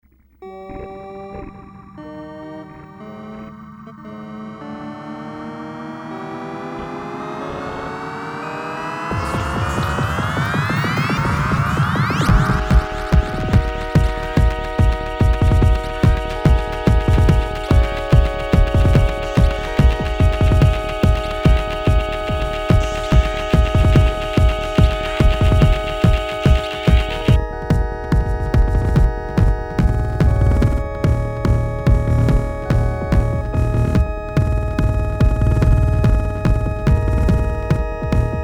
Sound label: organ dream rave; nighttime; weeklybeats 2014